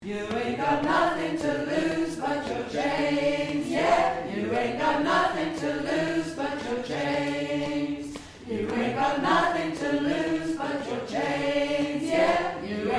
bass

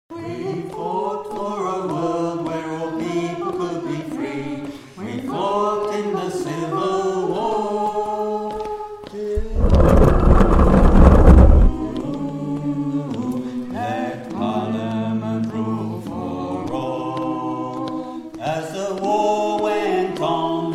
Bass

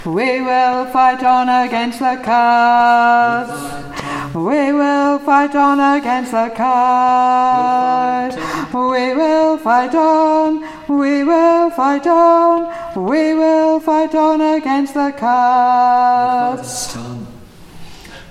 alto

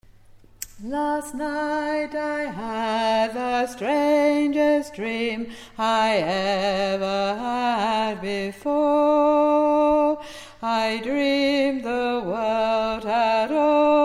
bass

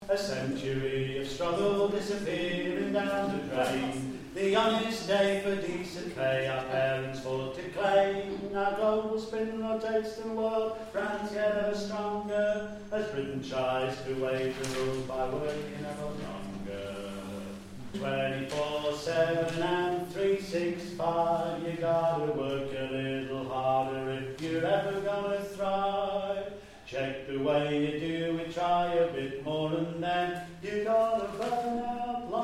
Bass

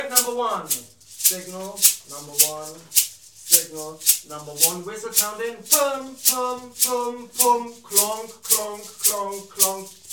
samba Break

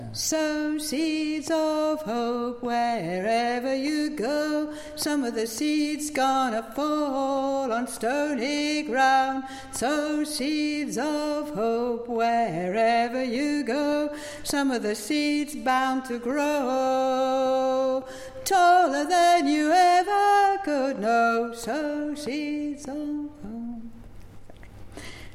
Tune

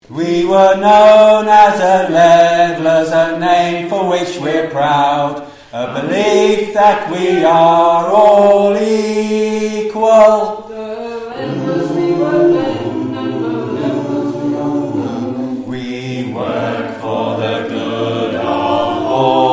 levellers

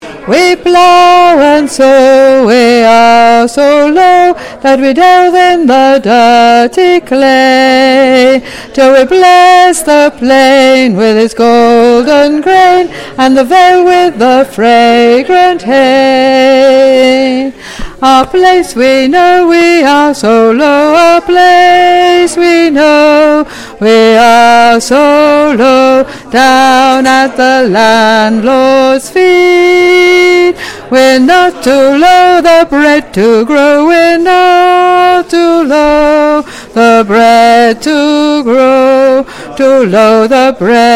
Bass

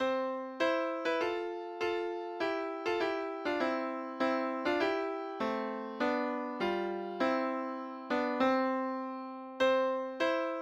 In A Carbon free Society Midi

